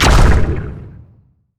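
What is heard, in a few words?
lets bounce small; LoL; zac base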